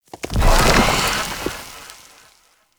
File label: Sand Kavat Dissolve; Warframe; Mummy